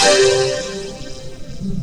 VOCODER DRUM; Rubber Chicken Software Sample Bank; DRUMS + PERC

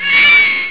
cry; Amiga Soundtracker Sample Packs

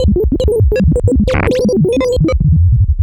Random, LFO & Noise; Sonic Foundry (Sony Creative Software); Prototechno

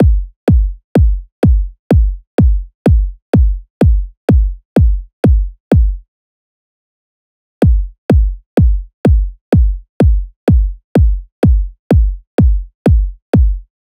loop kick 126; Seismic Loops

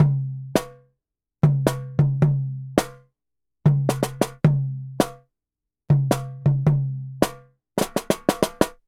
Jungle Kit, African; Sonic Foundry (Sony Creative Software); Continental Drift Aftershocks

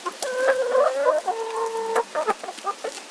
Chickens; outdoor

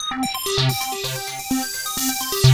PHASER, DANCE; Pro-Rec - Synthsonic; Z1 DANCE

